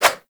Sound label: Spheres Throw Whoosh; Warframe; Weapons; Solstice Spheres